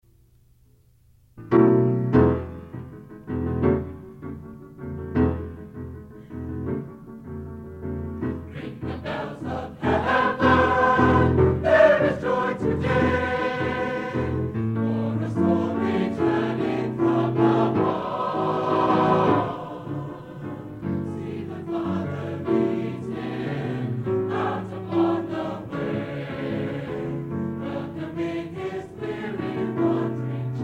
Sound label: Ring the Bells of Heaven Chorus